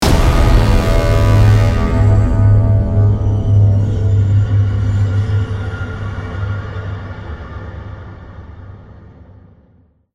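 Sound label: Music Stinger Snow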